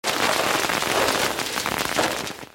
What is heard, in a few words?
confetti falling; Jade Heaven